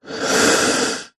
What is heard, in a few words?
human male 1x short inhale breath